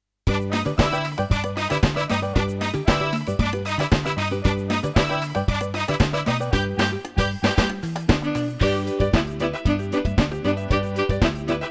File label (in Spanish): Solo pista